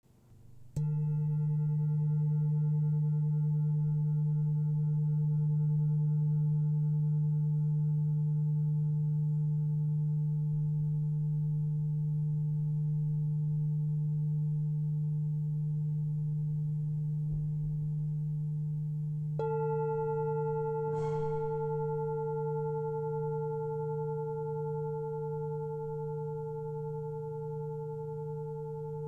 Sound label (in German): Doppeldorje Klangprobe